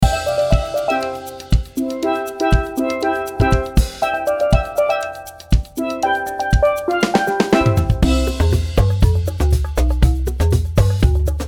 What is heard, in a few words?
ukulele; island sounds 2; bigfishaudio